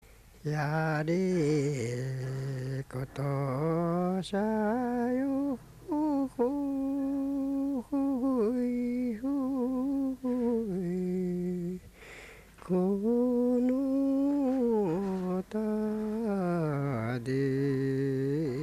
taueuta, minyou

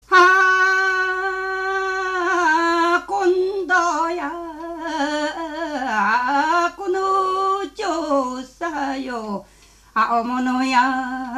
sumo jinku; 角力甚句; 匝瑳市

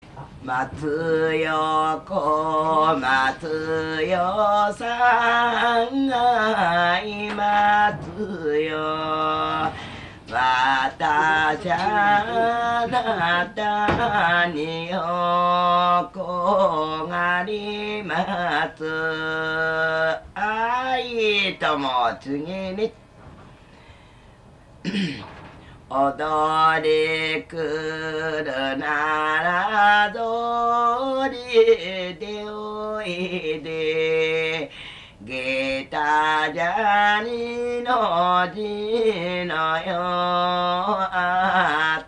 takotuki uta, minyou; 柏市; 布施; 地搗き歌; たこつきうた（石たこ歌）

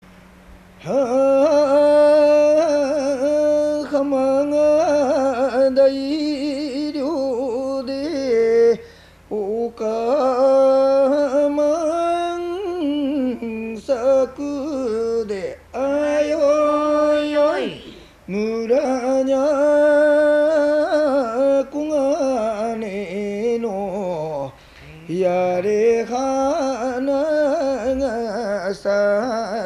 kujukuridairyokiyari, minyou

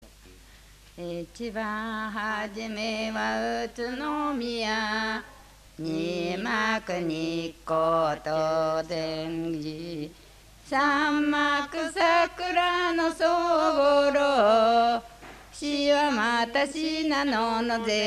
itibanhajime, minyou; 袖ケ浦市; 一番はじめ

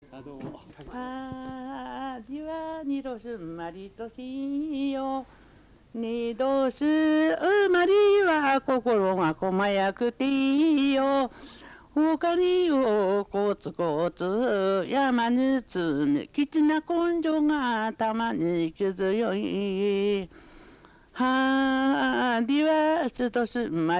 junisi kazoeuta; 旭市; 十二支数え歌

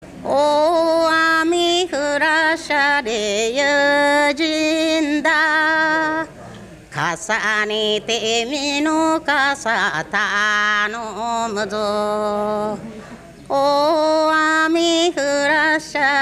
amagoiuta, minyou; 長南町; 雨乞歌

